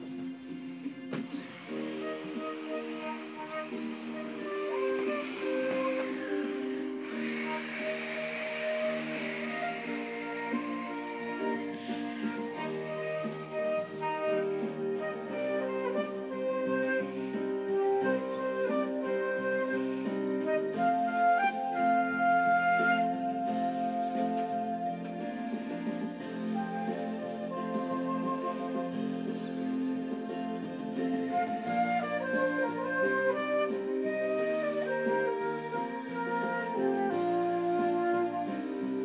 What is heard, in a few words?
flute